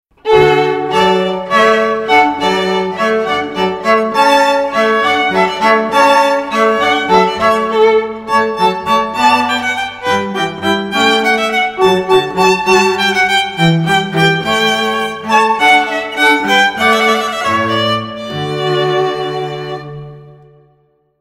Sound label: Hornpipe from Water Music, classical string quartet